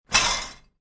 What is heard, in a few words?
Dishwasher Slams, Dishwasher slammed shut with sound of dishes; Kitchen; Household